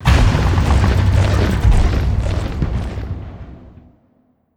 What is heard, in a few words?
ground battle; Stellaris